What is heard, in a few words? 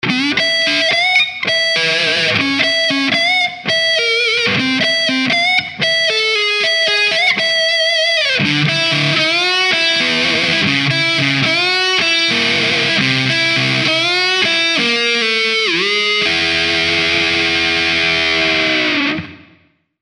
Texas Riff